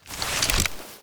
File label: rpg7 draw, weapons